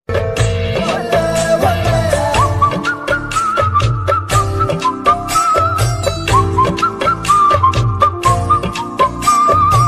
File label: flute song ringtone